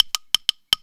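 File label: WOODSTICKS GROOVE; Best Service; World Colours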